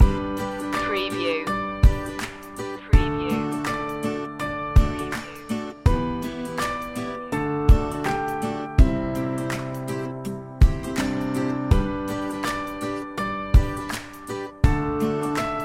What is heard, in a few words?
teamwork loop